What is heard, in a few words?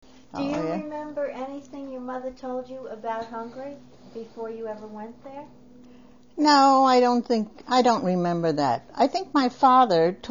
Lullaby in Hungarian, Oral History; Ellis Island Oral History